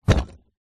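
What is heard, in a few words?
foley box of plaster pieces set down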